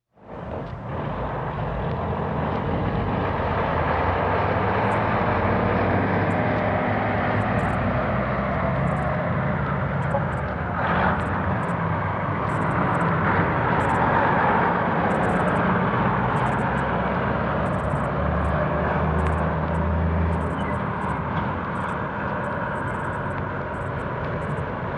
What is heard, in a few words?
Heavy Traffic, sound fx